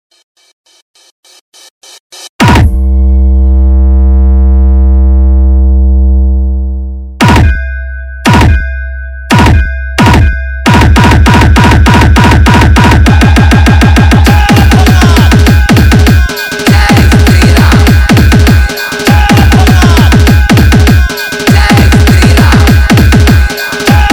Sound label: Speaker Check Hard Mix; Comptetion